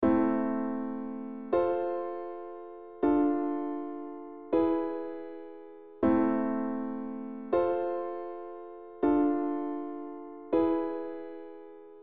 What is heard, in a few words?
Im VI IVm V; Les bases harmonie; Progression Accords